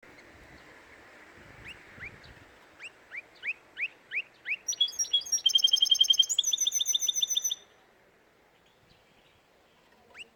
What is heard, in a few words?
Canary Singing